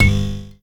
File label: Widget Impact